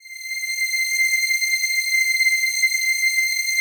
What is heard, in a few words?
PAD, DIGI-PADS